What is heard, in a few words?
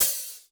Korg 01W MegaDrums; drum machines